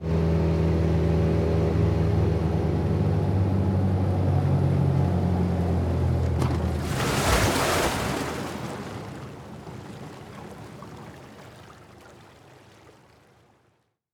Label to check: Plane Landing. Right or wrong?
Right